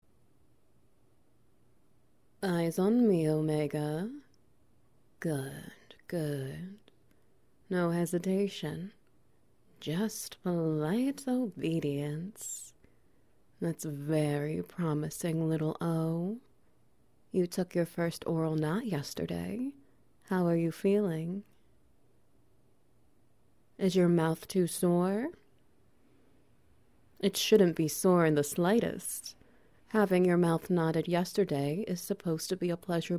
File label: Wet Noises; Learning to Take a Knot Anally